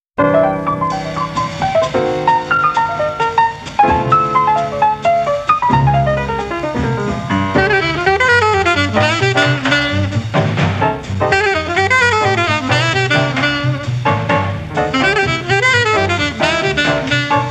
Blues, Quartet

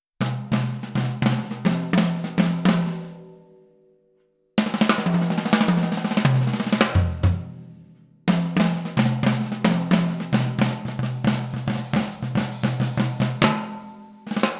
Drum Solo

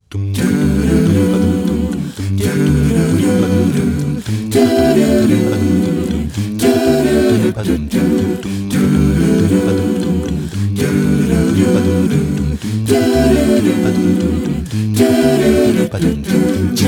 Jingle Bells

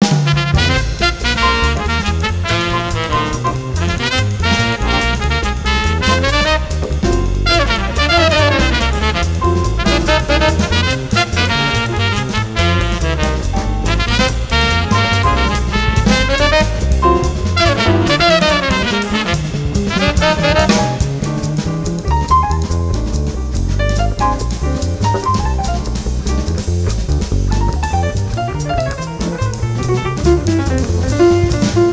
Fast Cat Blues

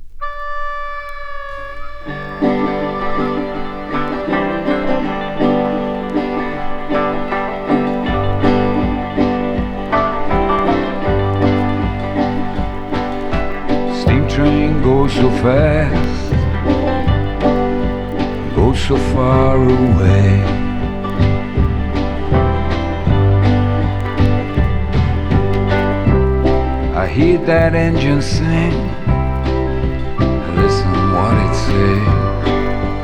Steam Train Blues

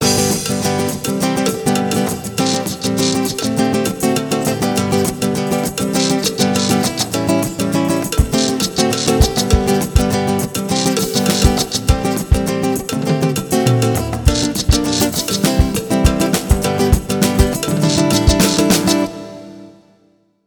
Backing Track, Happy Guitar Instrumentals

